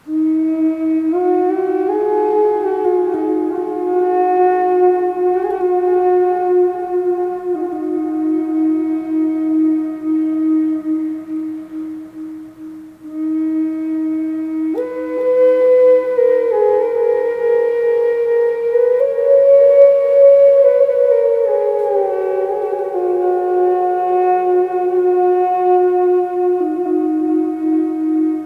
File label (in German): Aoelion E 432 7 loch mit reverb, drone flute aeolian tuning; e 432hz; nussbaum